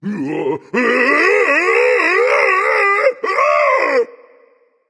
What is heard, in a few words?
fear, voice; Smite; ymir skin14